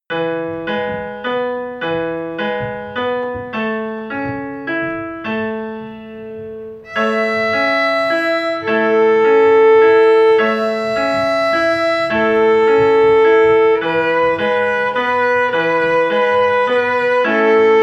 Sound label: violin; see saw